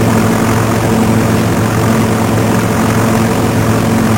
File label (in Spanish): avion